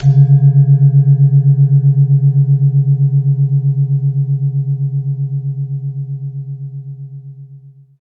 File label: Jegog